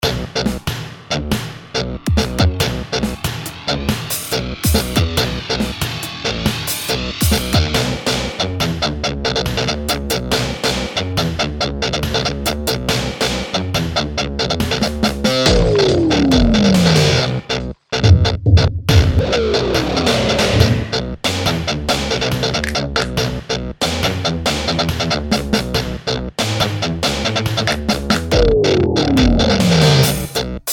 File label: bass git